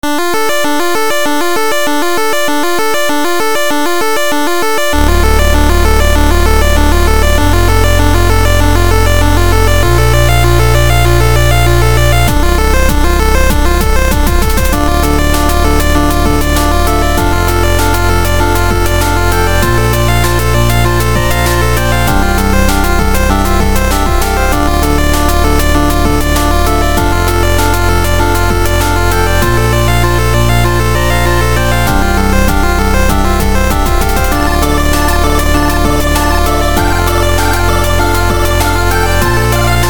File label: 8bit Song